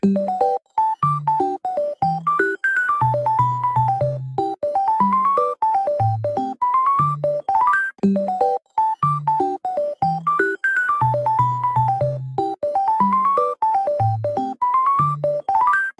ringtone, indian melody

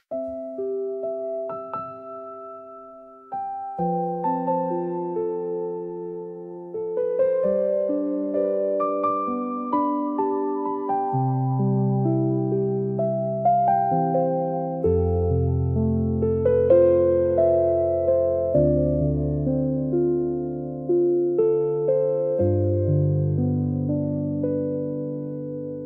PIANO

